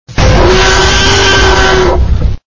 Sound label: attack